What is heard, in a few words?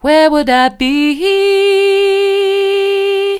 where would i be soft, vocal